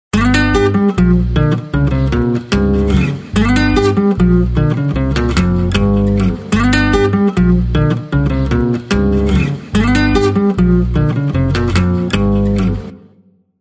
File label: Nokia Ringtones; Nokia 2760; Southeast Asia